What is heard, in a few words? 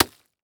Horror Scary Guts Human Guts Slammed On Ground